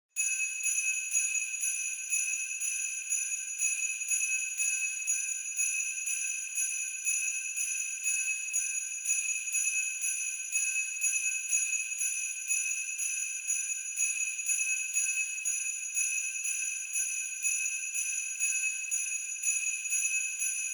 Sleigh Bells Reverb Knock Gentle

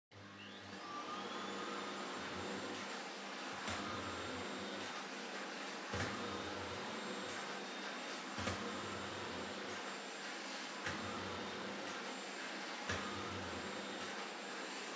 Vacuum Cleaner Far Vacuum Floor Wood Mid

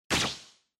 Motion Agility

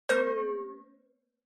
Onoma Negative Dry; オノマトペ ネガティブ; ドライ

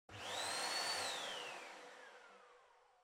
Vacuum Cleaner Far Vacuum

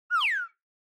Slide Whistle Overtone Down

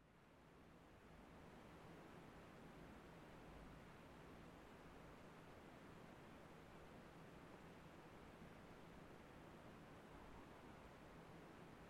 PC Operating Noise Far Room Noise

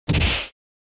SNES Fighting Hit

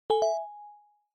Smartphone UI Sound Dry

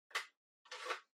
Portable MD Far Battery Remove

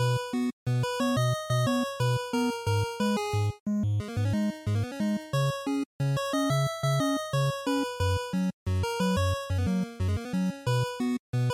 NES Shooter Stage Loop 180

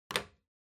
Lighter Put

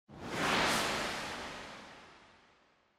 Camera Motion Reverb; シーン・カメラモーション

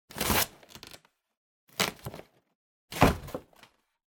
Cardboard Box Unbox; ダンボール; 開封; 梱包材

